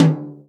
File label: tom, Real Drum Kit, jungle kit